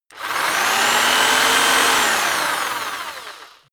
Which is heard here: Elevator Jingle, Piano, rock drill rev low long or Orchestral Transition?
rock drill rev low long